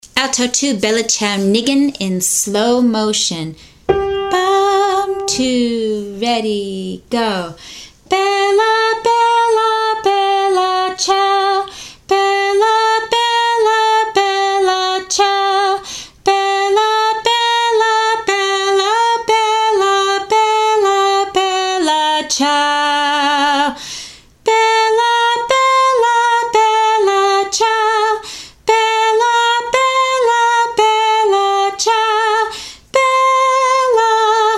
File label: Bella Ciao Alto II Nign Slowly